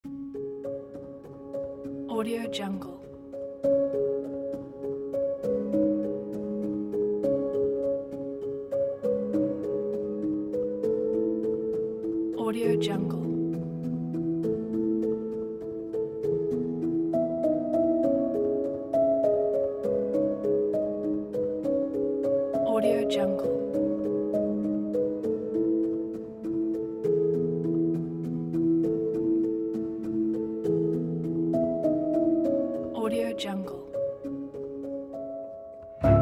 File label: classical fairy tale; موسیقی بی کلام; قصه کلاسیک